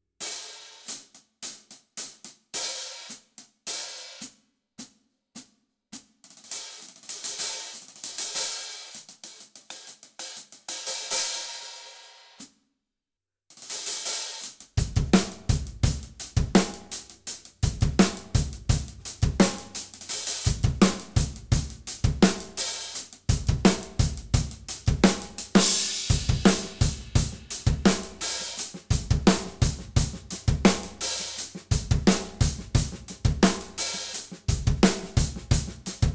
Extra Dry Med Thin HH

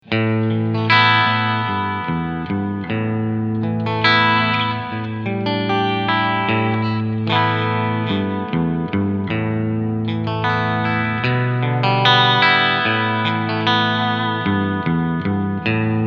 Quilter Superblock US Model 61 Clean

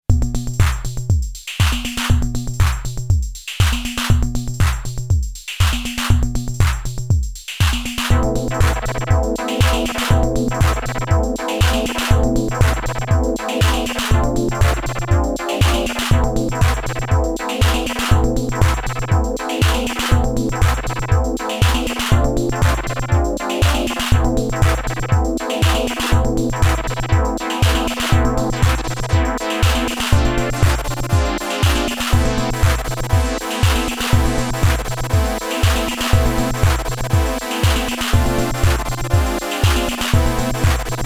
Synth ADS 7 Pattern

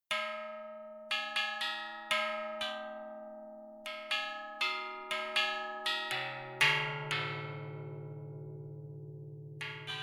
Peking Bianzhong

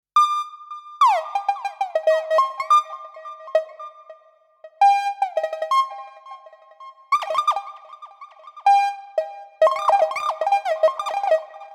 Frap Tools USTA Audio Rate Clock

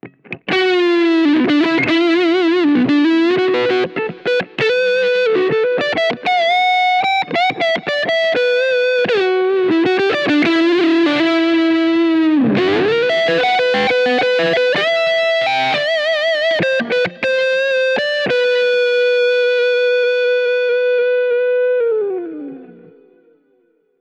Rams Head Breakup Amp; J Mascis

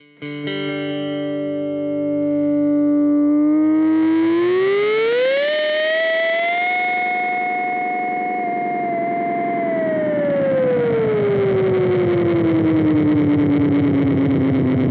JHS Panther Cub V2 Oszillation

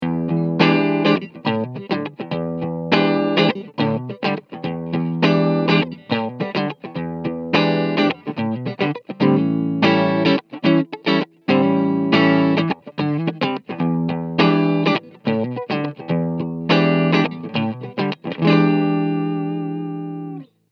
Clean off axis; Bogner; Captor X; SM57

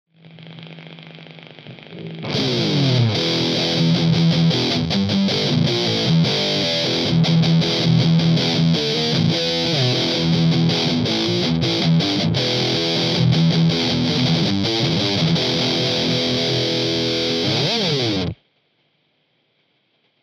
Plexi Combo Heavy Rhy; iAxe 629

